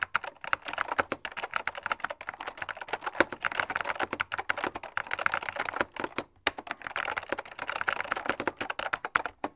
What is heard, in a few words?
KEY TYPE, KEYBOARD TYPING; 1000fx